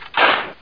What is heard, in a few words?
shovel